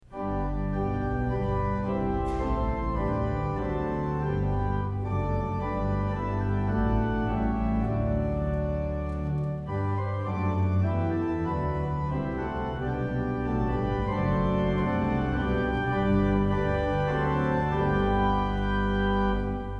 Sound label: hymn